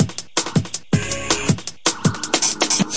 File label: electro tinny, drum loops and breaks, 161 bpm